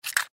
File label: Mini Dv Tape Case, Mini Dv Tape Case Remove Tape; Technology; Tape Players